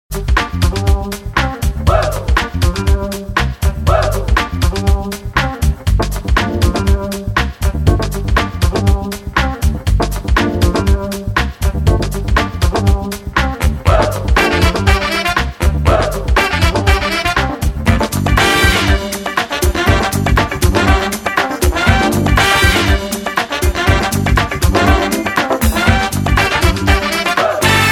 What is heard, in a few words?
GROOVE MAKEOVER